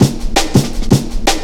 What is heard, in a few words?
165, drum loops, drum & bass